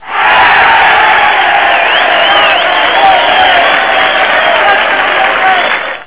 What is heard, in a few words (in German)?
tor, soccer; firearms